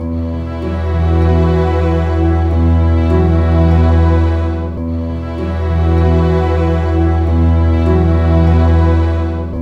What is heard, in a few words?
CLOCK; East West; Scoring Tools; ORC; Clock Time